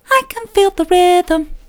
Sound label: i can feel the rhythm soft, vocal